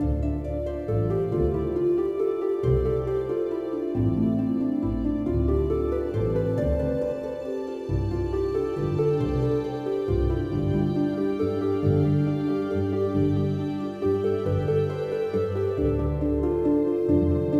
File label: In-Game Menu, gamerip; Sacred Odyssey Rise of Ayden; Android